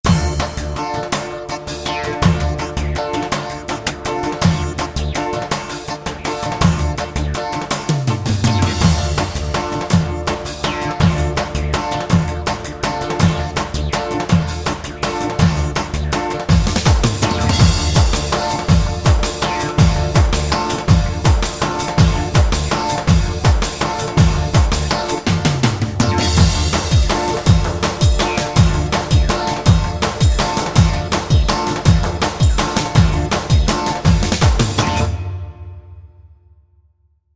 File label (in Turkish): 6-8 davul halay; Roland; OmeXr Yeni Ritim Demosu (6-8Davul)